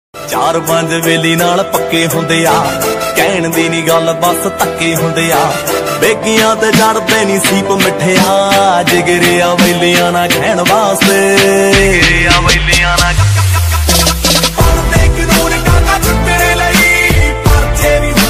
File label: Punjabi Ringtone